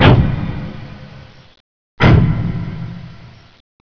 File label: big tick; dm clocktower